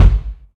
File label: bass drum sound f sharp key; kick drum; kick drum samples